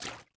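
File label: water run right, footsteps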